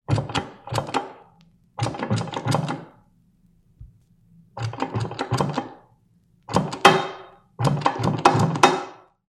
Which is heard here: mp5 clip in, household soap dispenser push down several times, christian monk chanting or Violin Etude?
household soap dispenser push down several times